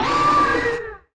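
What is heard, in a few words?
creature hiss howl, vocal elements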